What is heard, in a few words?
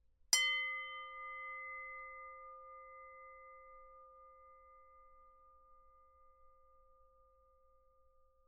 medium metal, aluphone